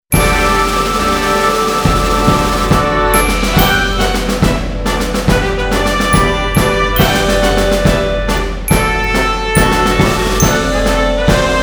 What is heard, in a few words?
College Fight Song March